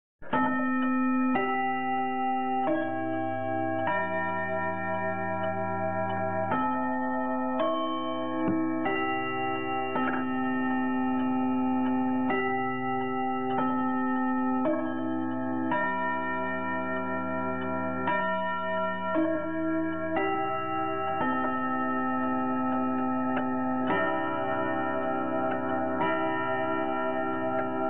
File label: long case midnight